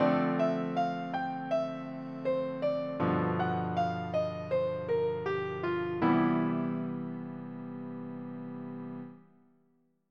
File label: blues scale